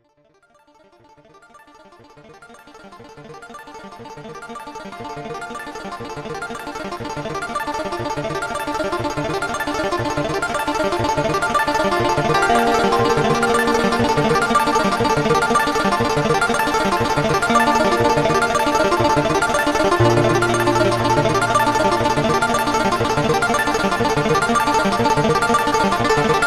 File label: Evolving Roland D 50 Arpeggio